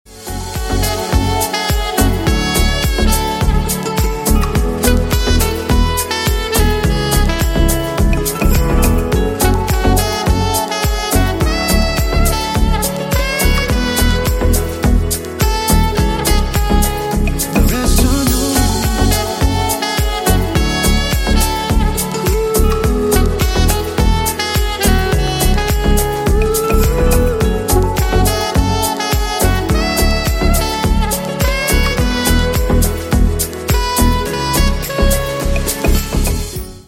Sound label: Saxophone